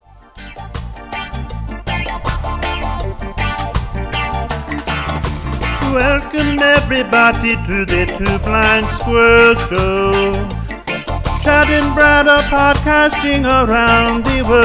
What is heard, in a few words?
reggae promo